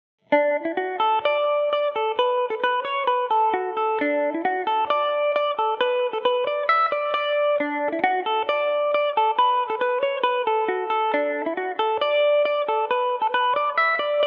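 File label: D major revelation, Irish mandolin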